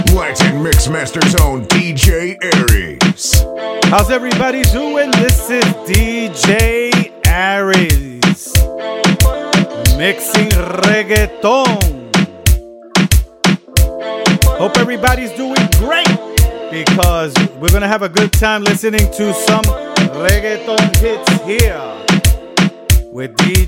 Reggaeton Session

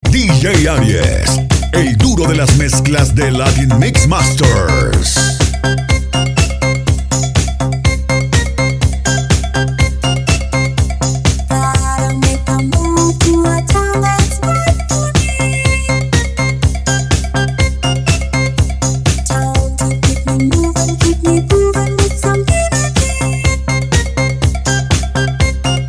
Disco Show Mix; Clean

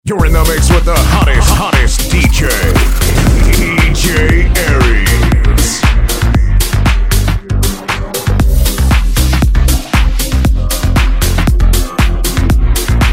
Dance Top40 Fusion Party

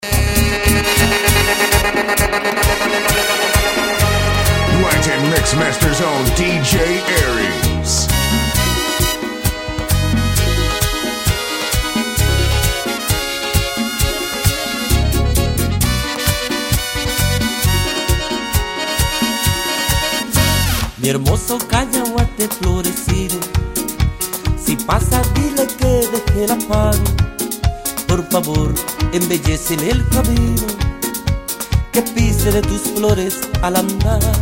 Merengue Retro Mix

